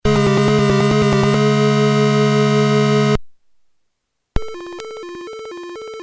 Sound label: MSX; PyramidWarp